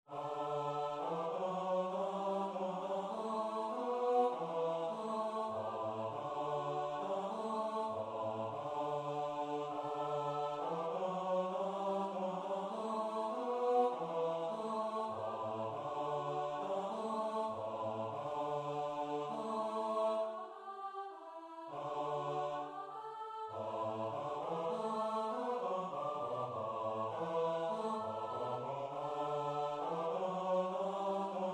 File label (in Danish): bas; Deck the Hall